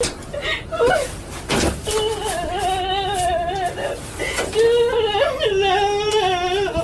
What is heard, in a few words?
moan